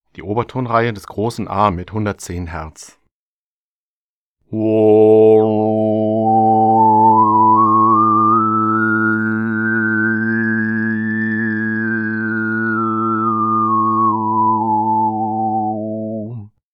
Obertonreihe von a; Buch Oberton Singen CD

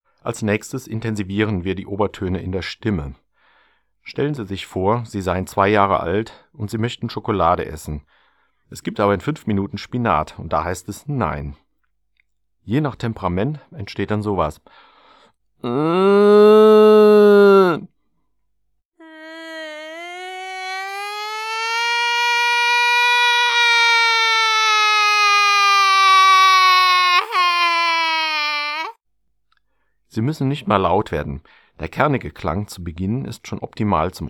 Knuttern; Buch Oberton Singen CD

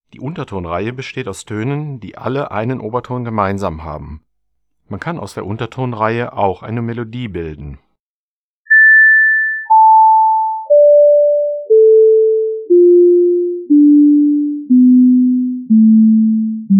Untertonreihe; Buch Oberton Singen CD

